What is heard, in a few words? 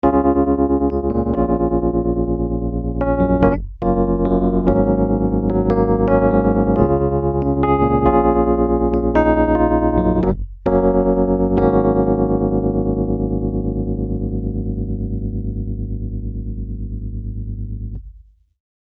In Phase; FERRO; PhaseDemo